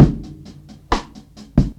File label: wood knock; Drum loops